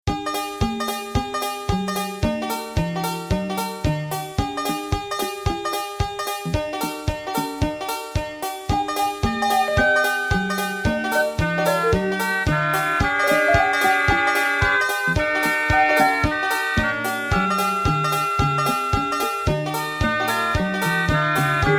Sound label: Fast Hillbilly Lullaby